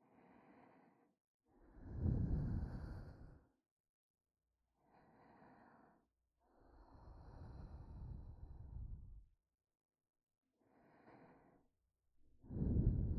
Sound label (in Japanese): 安眠耳舐め, ASMR